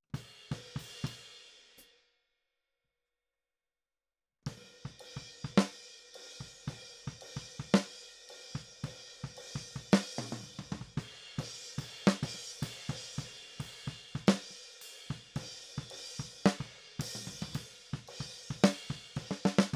Snare

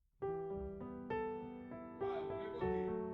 Piano A

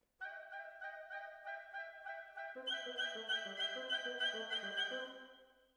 Cellos

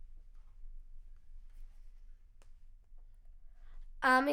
Children Choir; Studio Bekol Children Choir

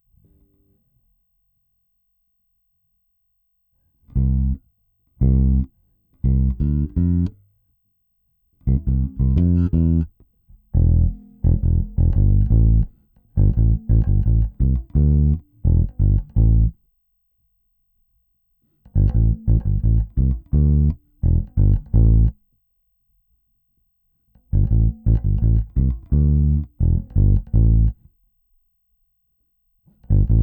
Bass

